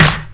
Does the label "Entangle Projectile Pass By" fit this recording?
No